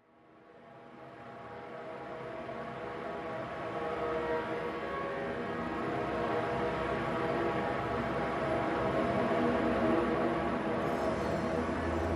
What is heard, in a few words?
No Percussion and No Pulse